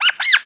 Topo, Verso del topo; Il JukeBox di Lara

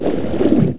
Immersione, Quando Lara nuota sotto l'acqua; Il JukeBox di Lara

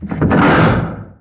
Apertura portello, Quando si apre un portello; Il JukeBox di Lara

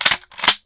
Ricarica, Quando Lara ricarica un'arma; Il JukeBox di Lara

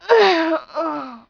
Morte 2; Quando Lara muore; Il JukeBox di Lara

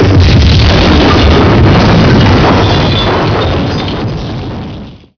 Esplosione, Quando Lara usa una granata e fa esplodere tutto; Il JukeBox di Lara

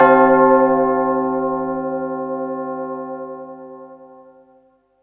Campana, Quando Lara spara sulla campana; Il JukeBox di Lara

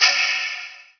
Inventario, Accesso all'inventario; Suoni oggetti; Il JukeBox di Lara

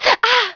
Aculei, Quando Lara si fa male per esempio sugli aculei mortali; Il JukeBox di Lara

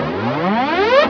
Allarme; Quando la presenza di Lara viene rilevata nelle basi nemiche; Il JukeBox di Lara

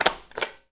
Interruttore, Quando Lara schiaccia un interruttore; Il JukeBox di Lara

